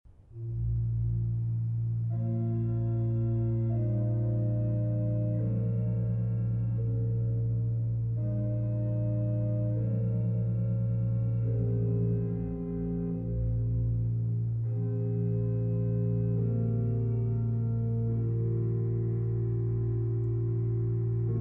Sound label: Sonatina Organ; Petrich